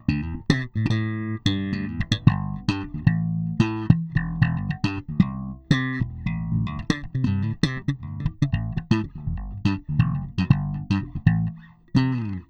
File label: slap